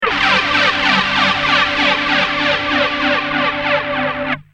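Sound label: guitar; mxr psd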